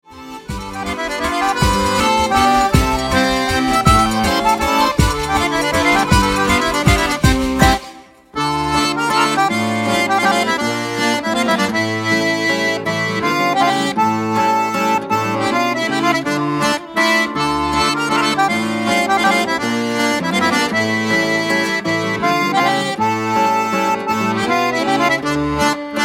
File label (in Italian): Mazurka di Copertino